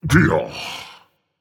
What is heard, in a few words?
Ugh, Dragon; fafnir